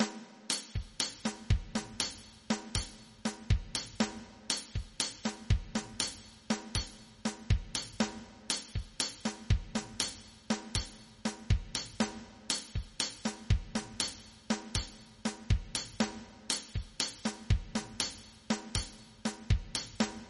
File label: palito, rhythm traditional; Creating Rhythms